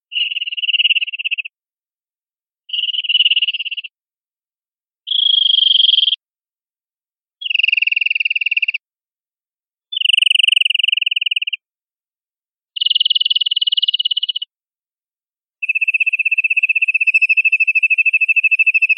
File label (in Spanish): chorros absorbidos